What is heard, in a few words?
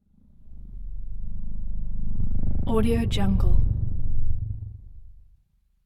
energy pass bys slow synth pulsing buzz hum; prosoundfx